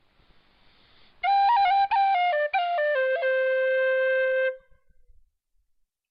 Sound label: cuts tonguing